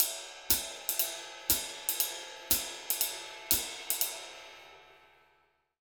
RID, Jazzistic, 120; Univers Sons

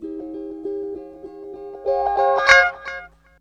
GUITAR, Funky GtrLicks; E-MU Formula 4000 Series Vol. 1; Hip Hop Nation

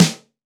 LD Snare; Snares

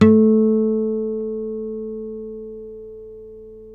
NYLON HARM A, GUITARS; Synclavier Sampler Library

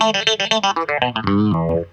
PICK GTR; USB Soundscan vol.32; Roots Disco; AKAI

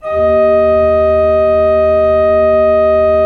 SWL D1, Cathedral Organ, SCHWELLWERK; Propeller Island

